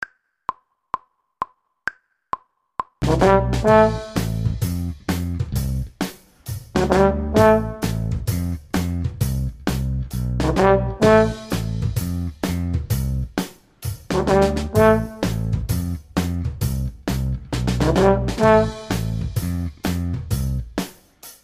baritone section

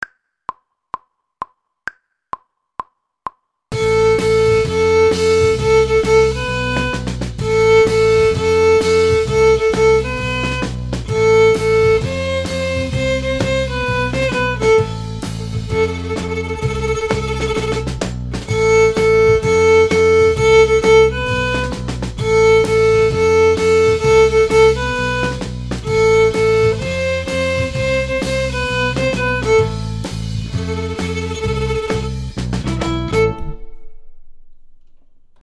viola

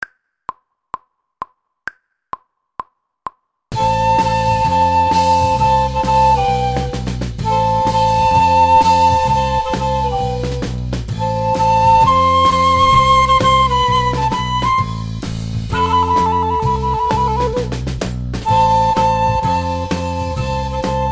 flute

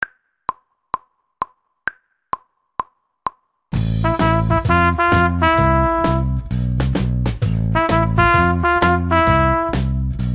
trumpet section

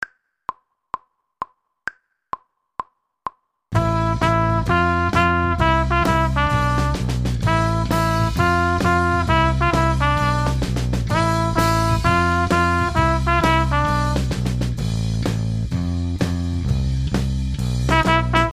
trumpet

